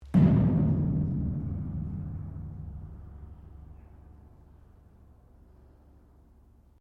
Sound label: METAL IMPACT HOLLOW; Materials; Foundation